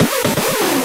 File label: Loops Fills; Vengeance Samples